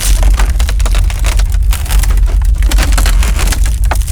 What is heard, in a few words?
environment wood falling loop